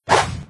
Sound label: slide; Cartoon Network - Toon Cup 2020